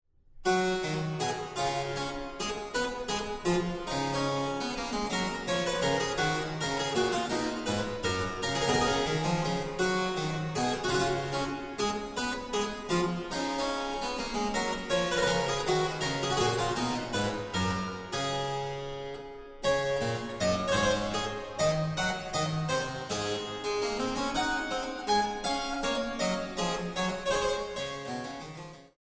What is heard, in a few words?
menuet g major